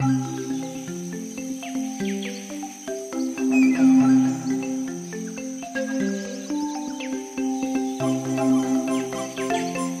background music; Crazy Mnky

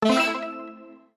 win ending; Highway B

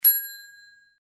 win ending; Candy Bona

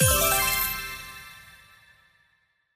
red packet spawn

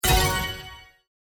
Fast Line; Holy Goat; winline

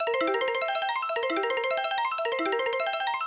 payout

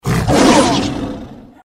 bonus lion fight; slots; sRoma

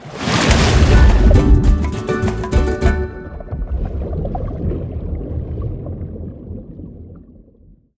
Total Win; Dolph Dive

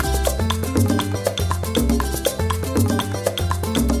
bgm pick chest

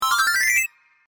win ending; Crazy Mnky

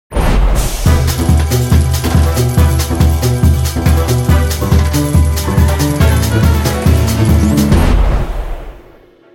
win Big incrementing music; Highway B

